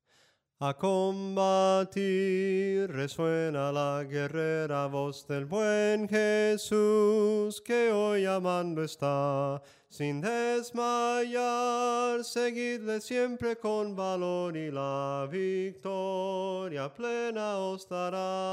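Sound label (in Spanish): SOPRANO CANTADO, himnos en melodia soprano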